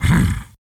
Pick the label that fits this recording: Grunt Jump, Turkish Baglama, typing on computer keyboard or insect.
Grunt Jump